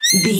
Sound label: voice fun mouse